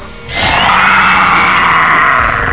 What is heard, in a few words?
scream, fast zombie; raptor zombies